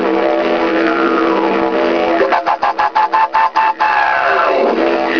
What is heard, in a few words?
didj; Malaysia; Cherating